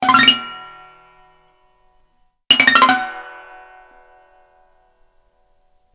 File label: lap harp strum; Music; DailySounds